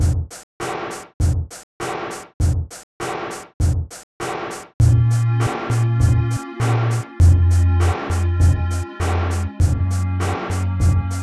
Sinewave project

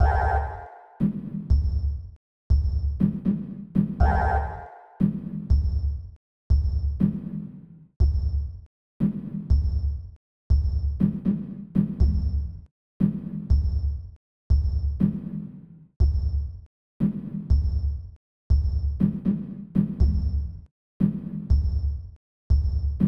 Sine Wave

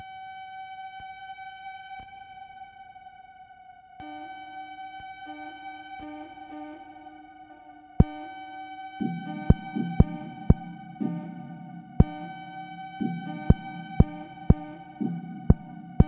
Sine

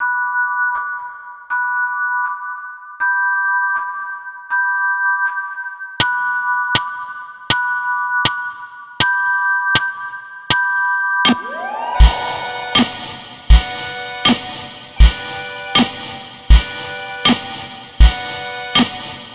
Sinewave

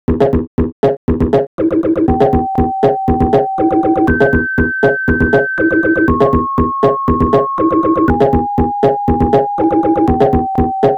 Sinewave

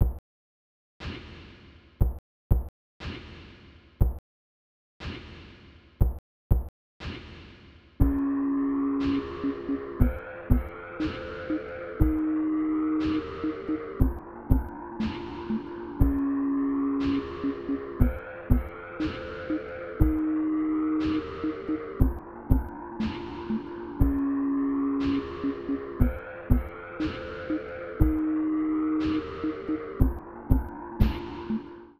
Sinewave

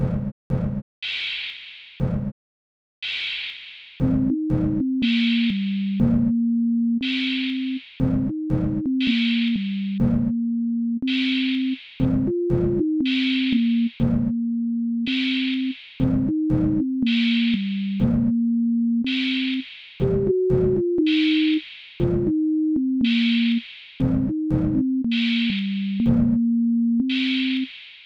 Sine Wave

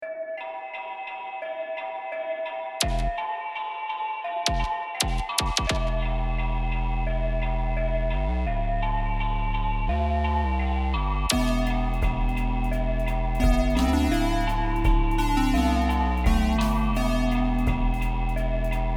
christmas midi track

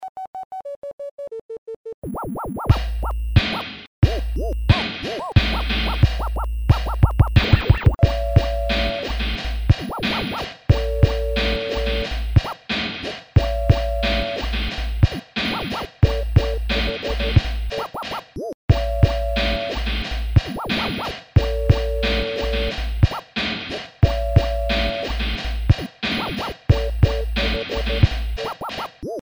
Sinwave

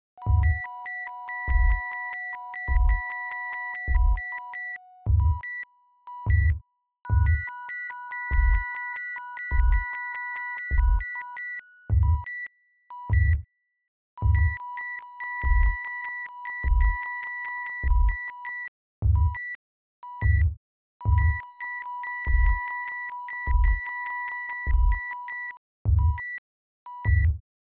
SIne Wave

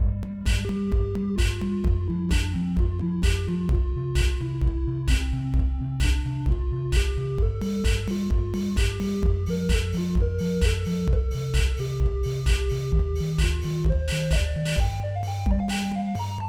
Sine Wave

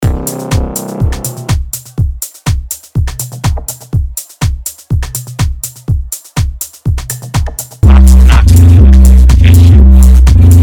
MIDI

